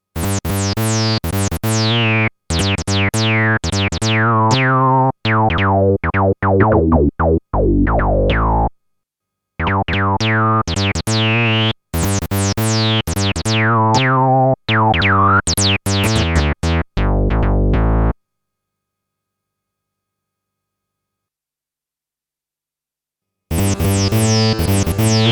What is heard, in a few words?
Pure Analog; VLX-1